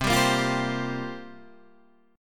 C 7th Suspended 4th, Guitar, Standard; C7sus4; 8,10,8,10,8,8; strummed; capo=2

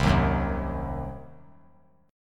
C Diminished C, 3C,3F,3A,3D; strummed; JGuitar

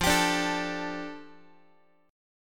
F 6th Add 9th F Guitar; strummed; chord; x,13,10,12,13,x; JGuitar

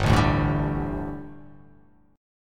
G Suspended 2nd G, down, Gsus2 Chord; strummed; JGuitar

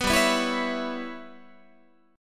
G 6th Flat 5th G, Guitar; G6b5 strummed

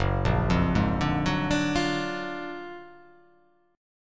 F sharp Augmented Major 9th F sharp

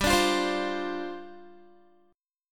G 7th Suspended 4th G down Guitar

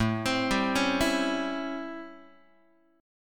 A 7th Sharp 9th A, Guitar; arpeggiated; JGuitar; x,5,3,5,5,3

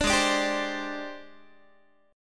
Ab Major 7th Flat 5th Ab, down, Guitar; AbM7b5 strummed

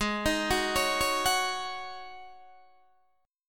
G sharp 7th Suspended 4th G sharp, Guitar DADGAD

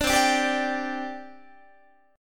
C Suspended 2nd, C, down, Guitar; Csus2 strummed

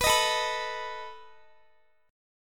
A Suspended 2nd Flat 5th A down Guitar; Asus2b5; strummed

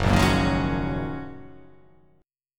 F sharp 6th Add 9th, Guitar; strummed; JGuitar

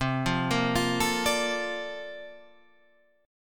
Bb Major 7th, guitar; arpeggiated; chord shape

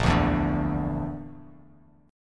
A sharp Major Flat 5th, Bass Standard; strummed; JGuitar

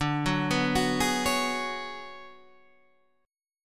B Minor 6th Add 9th D

